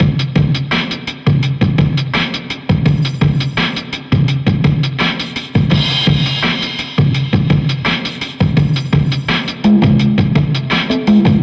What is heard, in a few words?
Drum Break, Trip-Hop Loops; Hard Rocks The Roof